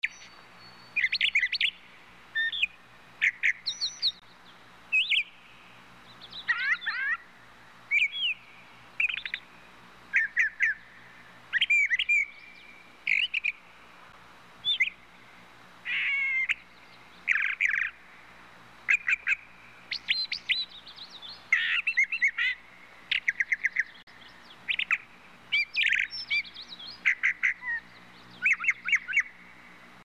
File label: atmo birds brown thrasher